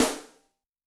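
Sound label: Open Rim D, Yamaha DTXpress-II snare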